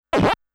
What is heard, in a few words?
Coin Credit; Namco Classic Collection Vol.2; arcade soundtrack